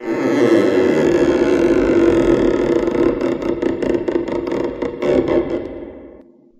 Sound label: creak stretching